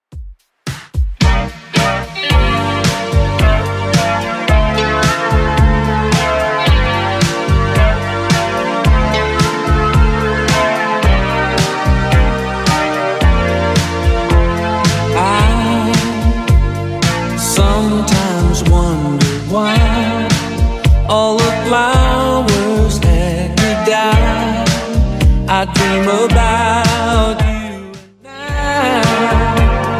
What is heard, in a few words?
80s Redrum 110bpm